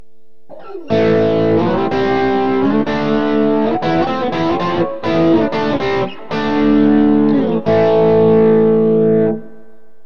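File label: emg81 bridge, guitar pickup; burny; fugly clips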